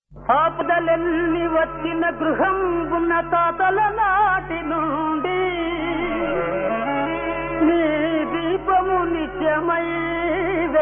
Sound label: Padyam; UmaSundari